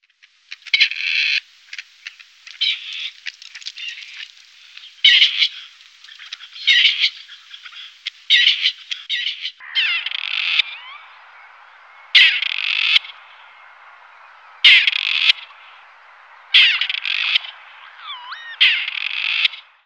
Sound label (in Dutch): Patrijs